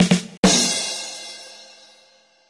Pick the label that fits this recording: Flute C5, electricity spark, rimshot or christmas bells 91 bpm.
rimshot